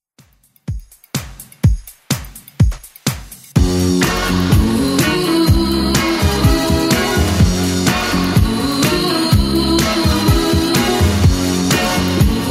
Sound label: 80s Redrum 125bpm